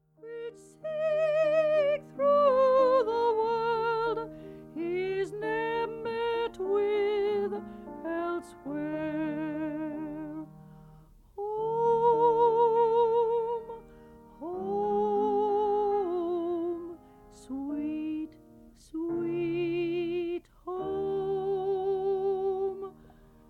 parlour piano

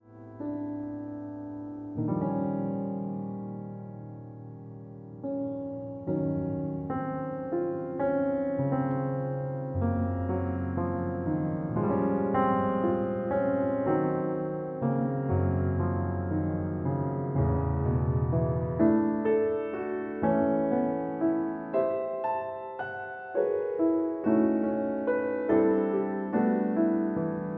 piano sketches

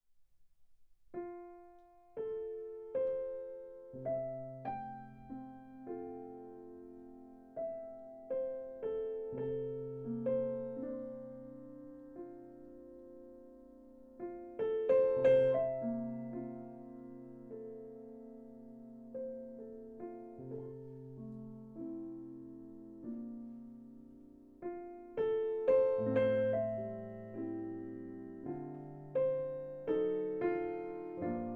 melody at night

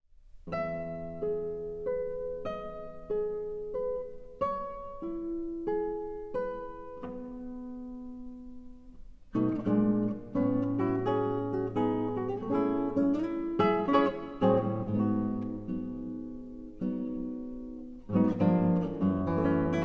my guitar story

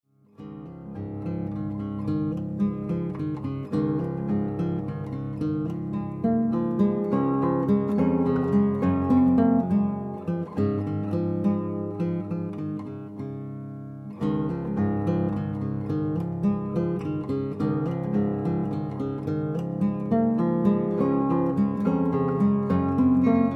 guitarscape

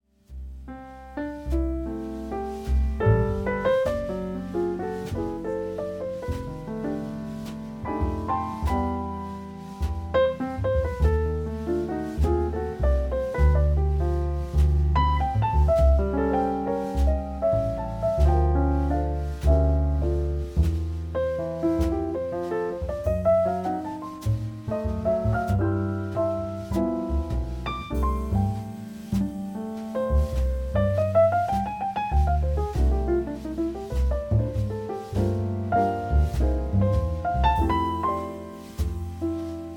jazz lullaby

